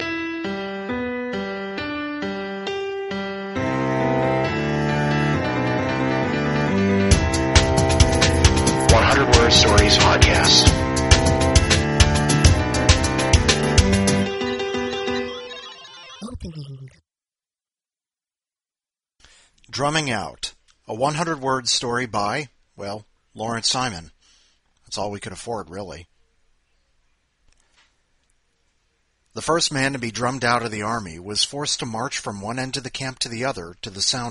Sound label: drumming out